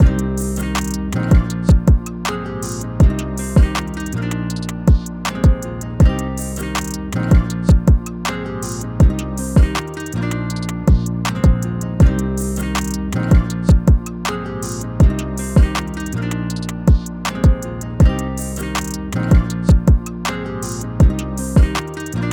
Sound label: Free beat trap